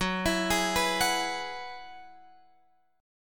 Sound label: F sharp 7th Suspended 2nd Suspended 4th F sharp down Guitar Open F; F#7sus2sus4 arpeggiated